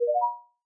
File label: match